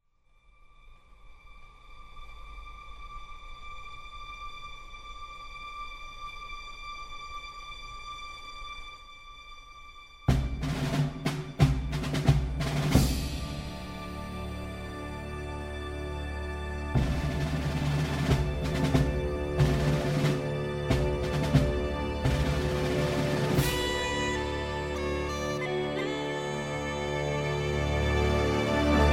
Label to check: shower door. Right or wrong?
Wrong